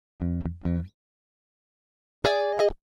133 Pop Guitar Funky F; Pop Rock; Electric

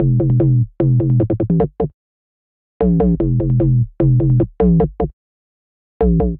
Ping G Major 150 bpm, bassline; MIDI Clips; Tonal; Ping It; Skitter and Step; Ableton Factory Packs

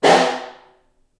SN2 Far, snare; Ableton Foundation FreePacks; Snare Designer

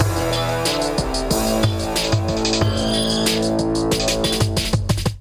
Street Kit groove 92 bpm; MIDI Clips; Ableton; Factory Packs; Beat Tools

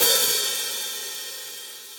Hihat Open Shank; Drum Hits; Standard Hits; Studio Basic; Ableton; Factory Packs; Session Drums Studio

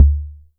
cmos quick, Kickbank; mpk mini presets; Ableton Foundation FreePacks

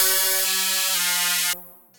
Killer Beez, sci-fi effects; Ableton; Mood Reel

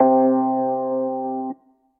Suitcase Radio Retro, Suitcase Piano; Ableton; Factory Packs; Electric Keyboards

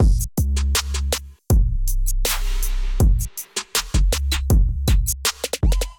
Candler Kit Groove 80 bpm; MIDI Clips; Ableton; Factory Packs; Beat Tools

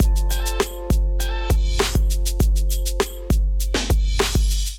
Kit groove 100 bpm, Drums Complex; MIDI Clips; Kingsway; Ableton; Chop and Swing

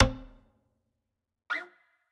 Zither Strikes; Instruments; Plucked Items; Ableton; Factory Packs; Lost and Found

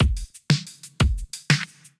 Western Australia Kit, Drums; Ableton; Drive and Glow

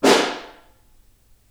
Snare Far

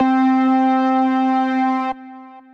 Process Keys, Synth Keys; Ableton; Factory Packs; Chop and Swing

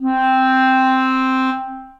Bb Clarinet Solo Legato; Ableton; Orchestral Woodwinds

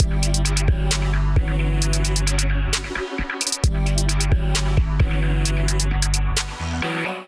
Agile Shot Kit groove 132 bpm; MIDI Clips; Ableton Factory Packs; Beat Tools

